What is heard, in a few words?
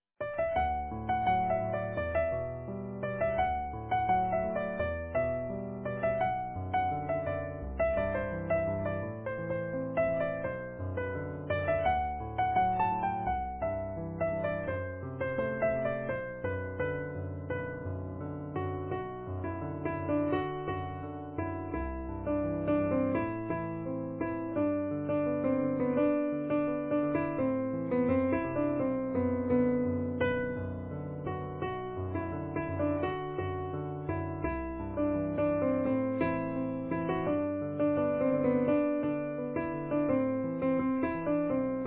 easy piano